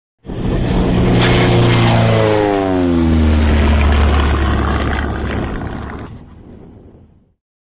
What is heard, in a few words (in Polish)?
samolot